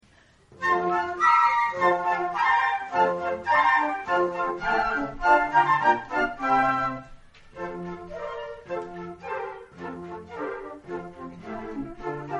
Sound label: concert, flute; AUTUMN concert 2016